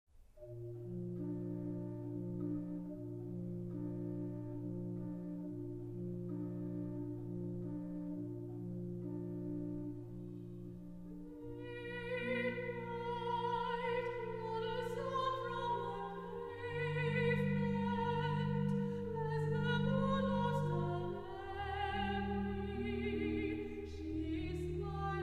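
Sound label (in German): soprano; trauermusik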